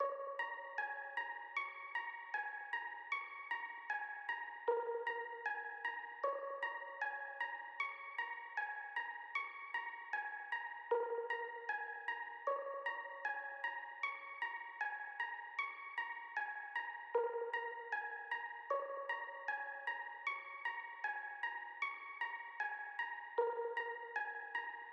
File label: 77 BPM B Min Arp; Cymatics; Destiny Gold Expansion; Light It Up